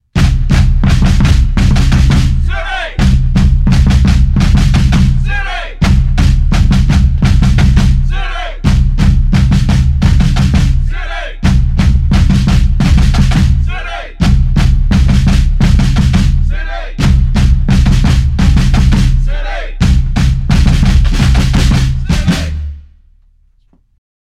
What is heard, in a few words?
Cadence